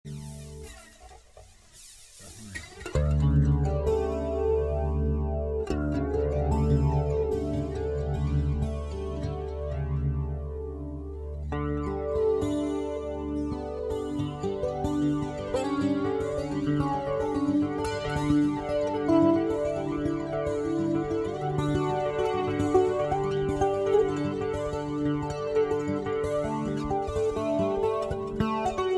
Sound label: improvisation